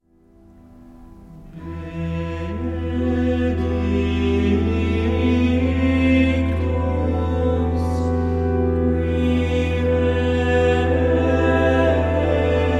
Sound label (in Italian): voci